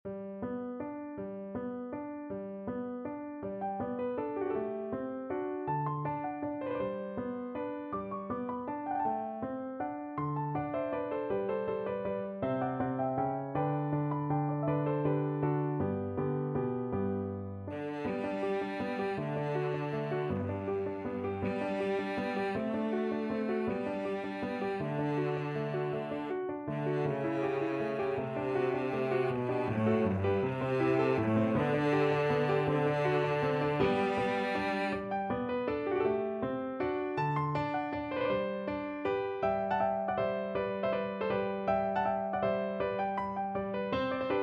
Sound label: Cello Duet